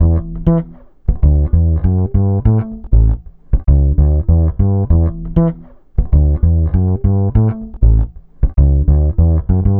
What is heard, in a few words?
Vintage Fretless, Electric Bass; Sonic Foundry (Sony Creative Software); Leo Cavallo Bass Taster